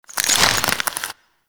Crystal Growths Attach; Citrine

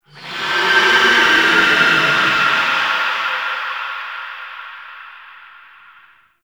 Pain Verb; Enemies; Warframe; Tau; Sentient Dactolyst

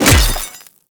Melee Hammer Impact Robotic Flesh; Hammers

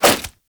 nunchuck end whoosh; Warframe; Infested; weapons

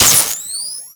Plasma Grenade Flesh Hit

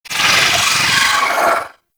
Vocal Death; Warframe; Moa Satyr

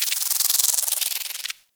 Tech Movement; Ambience; Warframe; Corpus Railjack

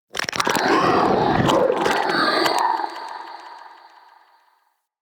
Shrimp Vocal Alerted, Creatures; Baliq Shrimp Glade

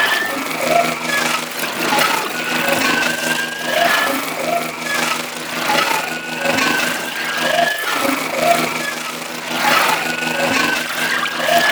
Suicide Spiderling Drain Loop; Enemies; Gameplay; Boss; Warframe; Oraxia

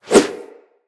Melee Idle Whoosh; Weapons

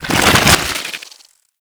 Dragon Luck Activate Ice

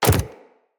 companion land default, footsteps; Pets; Zanuka

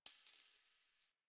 Coin Drop

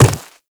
Infested Footsteps Quadruped Medium Walk Dirt

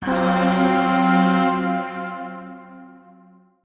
Void Shawzin Hirajoshi Chord; Warframe; Zariman Void Shawzin

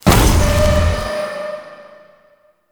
Ground Spike Hit player; Enemies; Abilities; Warframe; Zariman; Void Angel

